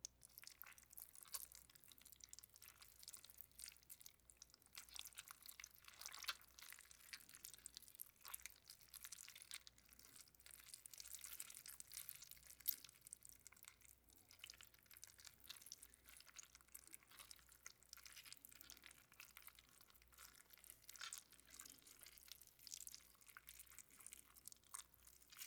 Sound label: Blood Oozing; Fairlight Sound Lib